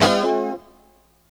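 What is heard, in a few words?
G 3 A mono slide R, guitars; Spectrasonics; Hans Zimmer Guitars VOL-1; 90's Sample CDs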